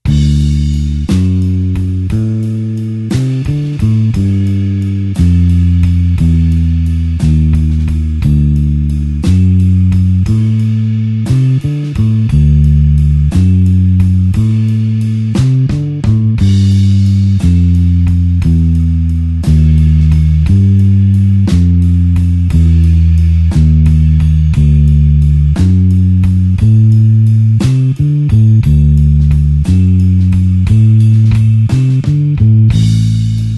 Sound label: blues bassline slow D